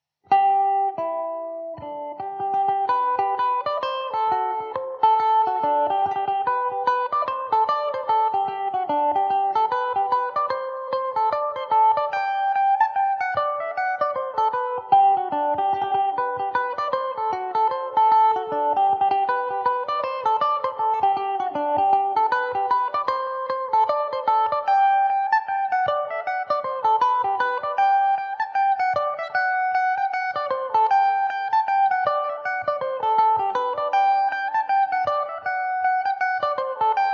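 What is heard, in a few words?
G major mandobird, irish mandolin; Gilbert Clancy’s; Epiphone Mandobird VIII electric mandolin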